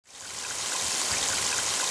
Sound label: Ambient Rain